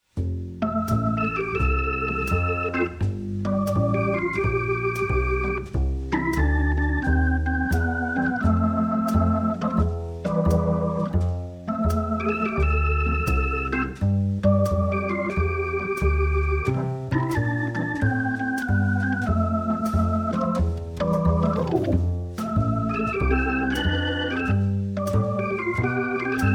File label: organ music